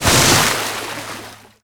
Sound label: zodiac splash small; veh zodiac splash small; Modern Warfare